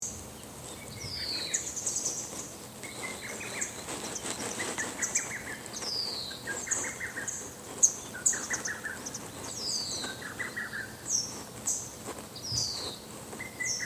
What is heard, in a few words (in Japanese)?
ホトトギス; 今、こんな特徴的な鳥の鳴き声が聞かれます。